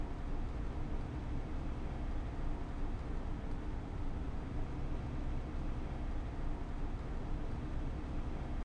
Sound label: Truck Moving Slow